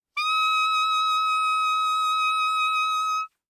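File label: Soprano Saxophone vibrato ff E6; Woodwinds; Bb Soprano Saxophone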